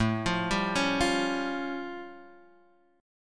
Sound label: A Augmented 7th A Guitar Half Step Down